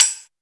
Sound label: TAMBRIN, TAMBOURIN; Big Beat Samples & More; AKAI